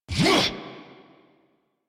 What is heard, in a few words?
Melee Attack; New War; Erra